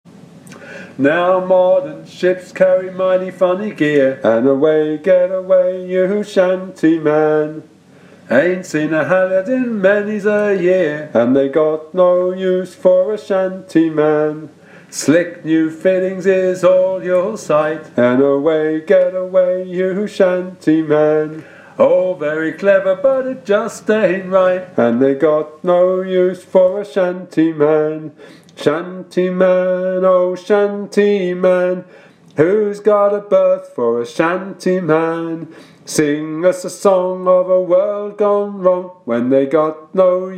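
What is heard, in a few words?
Shantyman - tenor, sea shanty teaching track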